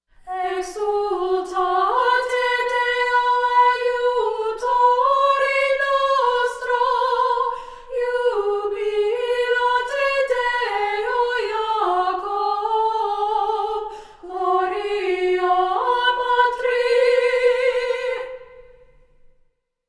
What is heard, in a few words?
choir